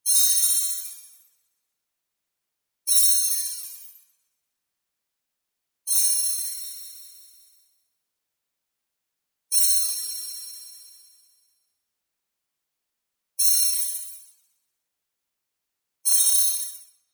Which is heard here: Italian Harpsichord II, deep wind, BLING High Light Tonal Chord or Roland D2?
BLING High Light Tonal Chord